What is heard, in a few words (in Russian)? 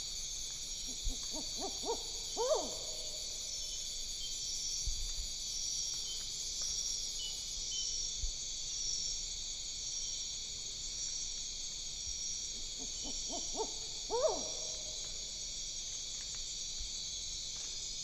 zebrovaya tsikkaba strix huhula huhula, zvuki nochnyh ptits